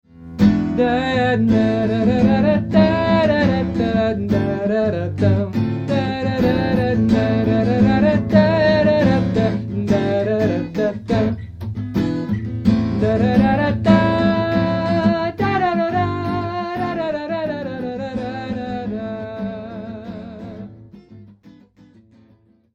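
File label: Jazz in a minor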